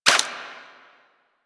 Necramech Repair Drone Idle Short; Enemies; Warframe

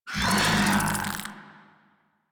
Desert Skate Vocal Attack F; Warframe

